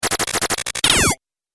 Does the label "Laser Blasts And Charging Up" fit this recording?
Yes